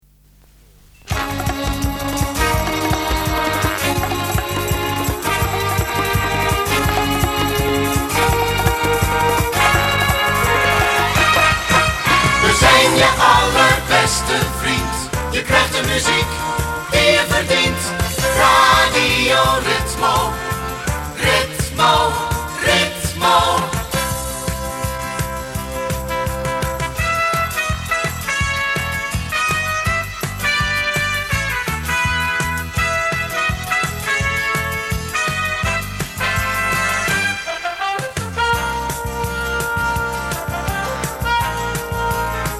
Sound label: jingle; Ritmo